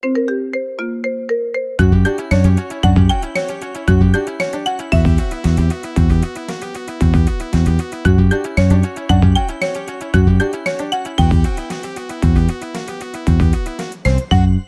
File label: Marimba Remix; Knight Rider